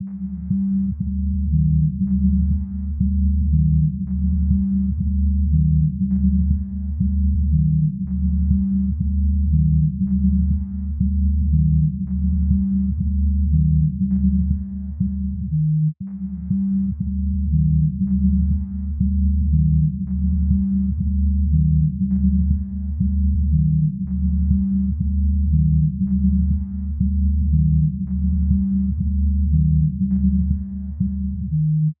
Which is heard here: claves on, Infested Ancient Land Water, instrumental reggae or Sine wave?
Sine wave